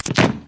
wall hit, headcrab poison; Source Engine; video game; npc

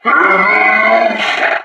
yeti statue growl; Source Engine; tf; lair

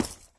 taunt shotgun shoulder; video games; source engine; tf

